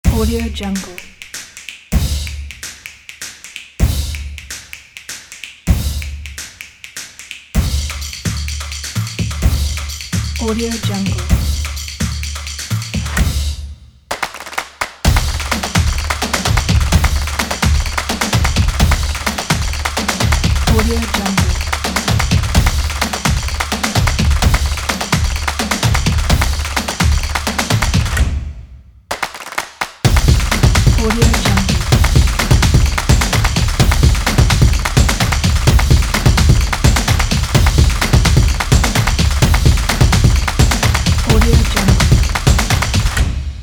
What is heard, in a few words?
drumming